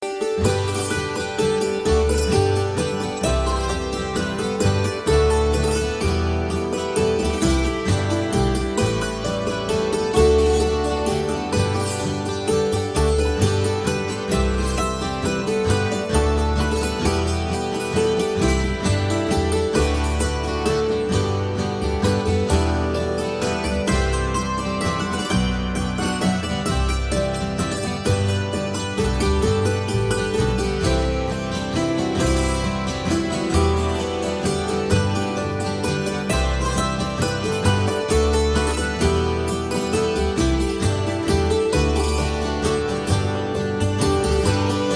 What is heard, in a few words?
Waltz